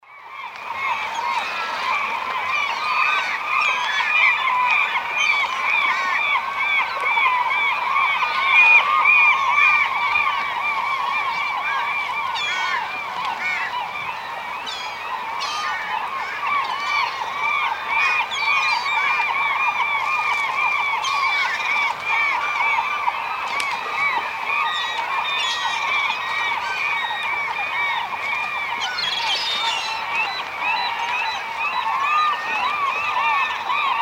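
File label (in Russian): птичий базар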